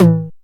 909 hi Tom; Quasimidi Rave-o-lution 309

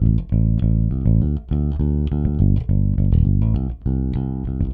Rock, Bass; Bass X

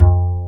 UPRIT BS F2, WOOD BASS

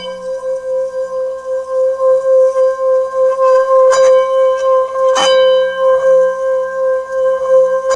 SINGING BOWL; Rubber Chicken Software Sample Bank

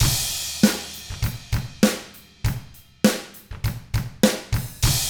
ROCK, Drums; ILIO; Double Platinum Drums 1

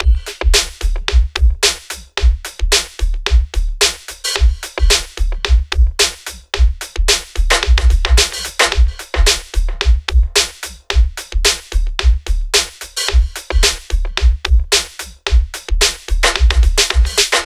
junk hip hop 110bpm

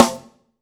AMB SN, AMBIENCE SN

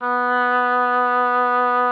Oboe, B3; Xsample VOL-6

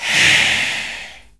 WHISPER AY; Spectrasonics; Heart of Africa 1; WHISPERS

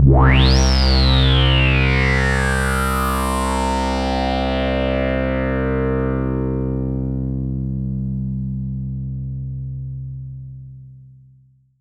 pad C2, 5U Modular; Polyend; Simulacrum Sounds; 1 Shots; Synths; Pads

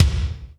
BD, Bassdrums, Roland TD-20

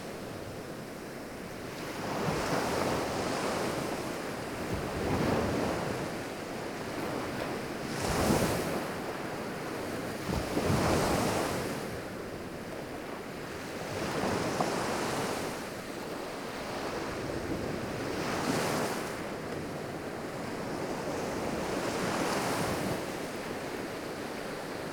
Surf Short Ocean Water Spl, water sounds

